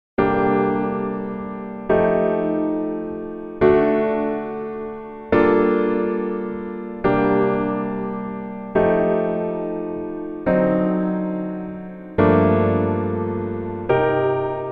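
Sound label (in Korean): Piano version -1키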